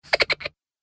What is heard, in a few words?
Cartoon Gremlin Sniggers; Cartoon Voice